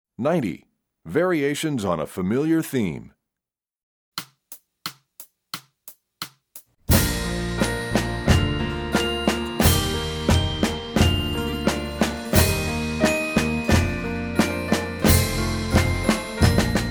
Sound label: Variations, Percussion; EE2000 Book1